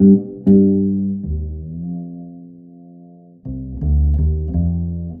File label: bass, Loops